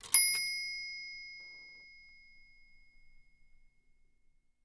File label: Typewriter Margin; typewriters